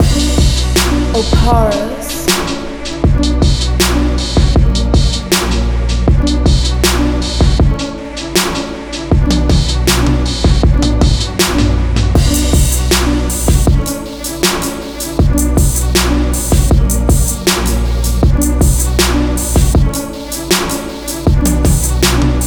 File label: 79 BPM, instrumental